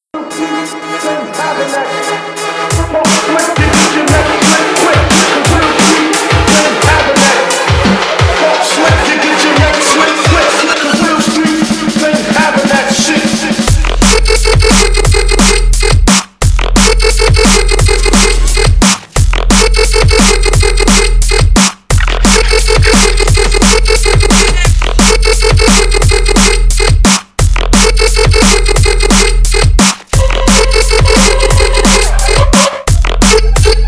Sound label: music